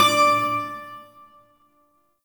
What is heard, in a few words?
Shawzin Oct Three D; Warframe; Duviri Shawzin